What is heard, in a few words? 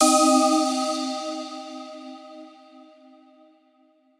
Retry Jingle; Friday Night Funkin' vs. PGT OST PC mod gamerip